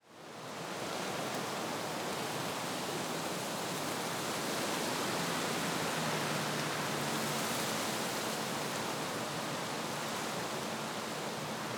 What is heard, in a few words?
Wind Trees Cattails; Soundopolis; Natures Fury; Fienup